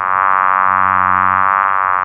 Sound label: bumblebee fly